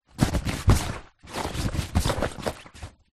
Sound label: bag ruck sack handling rummage